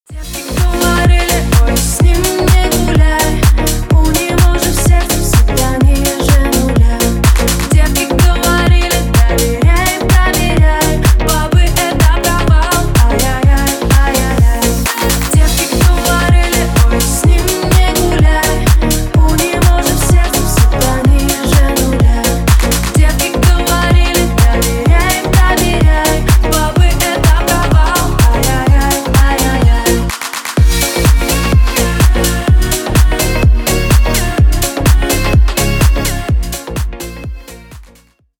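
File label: remix ringtone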